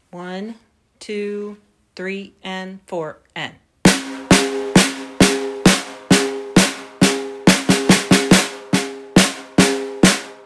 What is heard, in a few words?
percussion